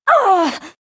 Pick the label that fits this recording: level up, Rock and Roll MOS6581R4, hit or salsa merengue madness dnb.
hit